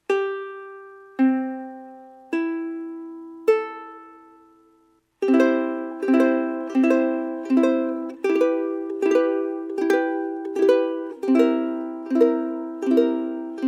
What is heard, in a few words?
ukulele; ukkit